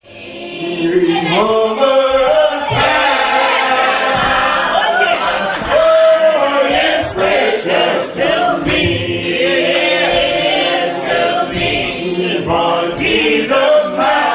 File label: harp